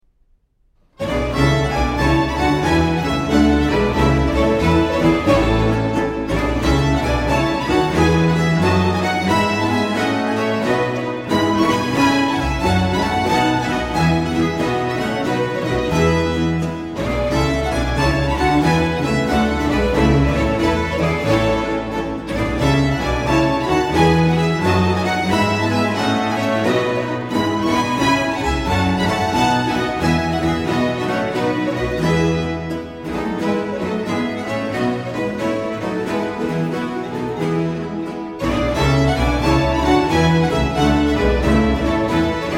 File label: Ouverture for Strings & Continuo in A Major, Giga